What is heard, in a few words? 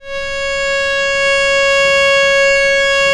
VL C#4, VIOLE, Historical Instruments; USB Soundscan vol.23